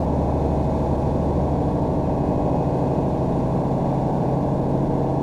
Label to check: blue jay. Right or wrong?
Wrong